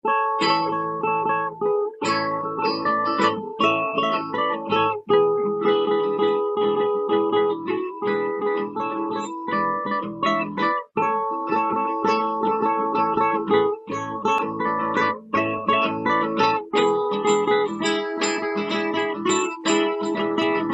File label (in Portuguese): solo instrumental